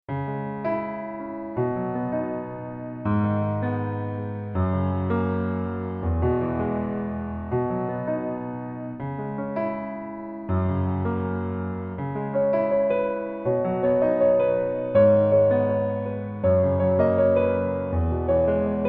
Piano

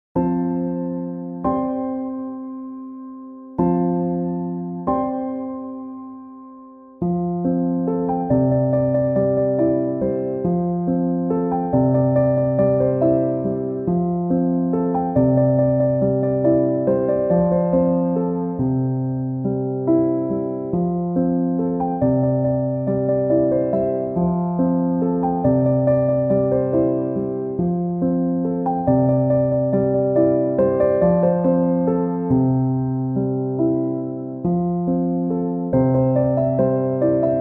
chill piano instrumental

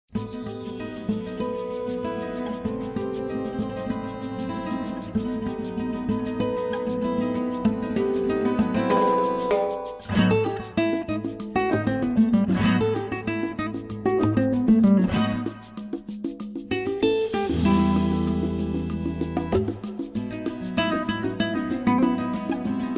Amazing Chill Guitar; CHILL OUT SUAVE

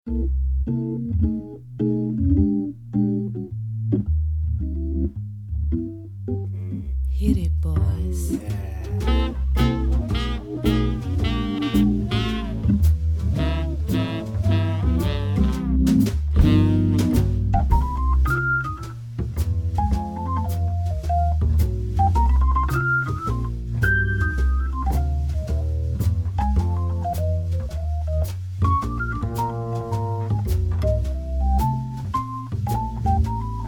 Organ; Lounge Mix-Jazz Acid

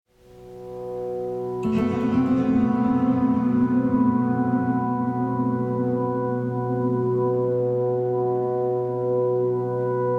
Spa Zen Music

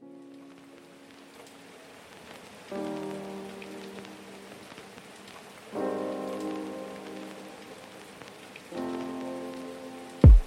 instrumental lofi

